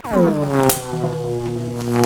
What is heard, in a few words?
TESLA, Science Fiction; E-MU Producer Series Vol. 3 – Hollywood Sound Effects; Tesla 10 -19